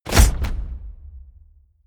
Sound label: medieval click heavy negative; hits impacts